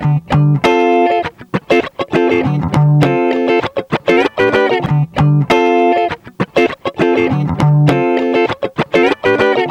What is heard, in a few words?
Guitar Funk Riff Dm